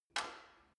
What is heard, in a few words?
gym bicycle saddle unlock soft